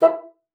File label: bassoon, E4; Xsample VOL-6